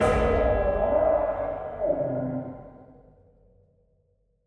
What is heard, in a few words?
Choir Boy Dth